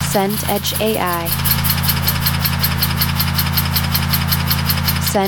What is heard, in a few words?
concrete mixer